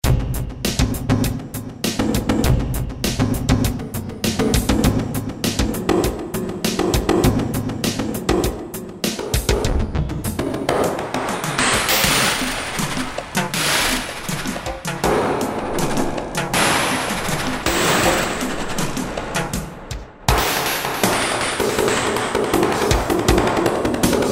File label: push noise